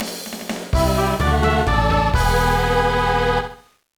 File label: Unknown Jingle; Golden Sun: The Lost Age